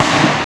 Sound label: POP